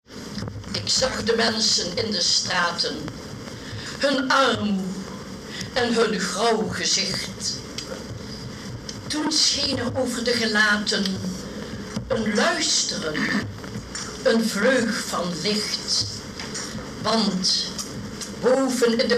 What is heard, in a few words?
carillon